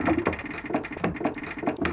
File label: Wind Mill Loop; Cars; XBOX; Ambient